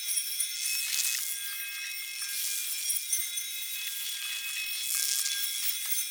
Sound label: Team Buff Active Loop; Enemy; Sinecure; Melee Grunt Dual Agent; Abilities